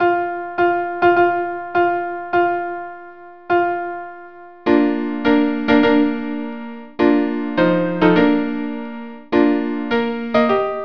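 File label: bridal ch violin viola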